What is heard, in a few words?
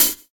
short pedal hi hat single hit a sharp key, hat sample tuned to the A# note